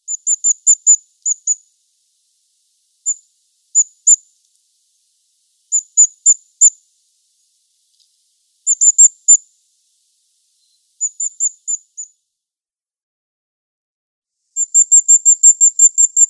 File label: Firecrest calls